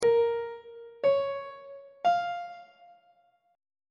f phrygian chord 4 is b flat minor; on treble clef

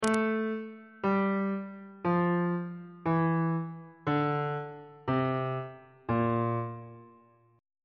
b flat lydian mode descending on bass clef

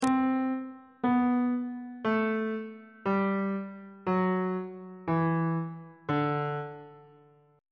d mixolydian mode descending on bass clef

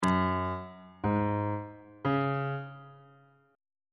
g flat suspended 2nd triad chord intervals

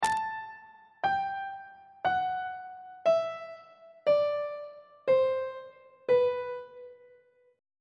b phrygian mode descending on treble clef

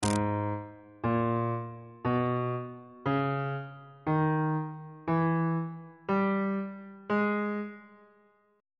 a flat harmonic minor key signature

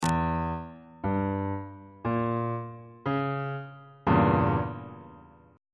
f flat diminished 7th chord on bass clef

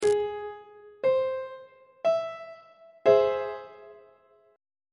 f flat augmented 1st inversion on treble clef

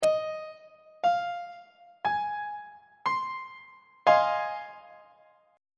f minor 7th 3rd inversion; on treble clef

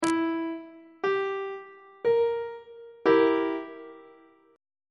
e diminished chord on treble clef

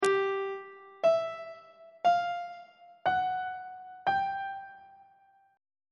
g dim7 min7 maj7 aug7 intervals on treble clef

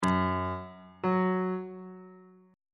g flat note on bass clef

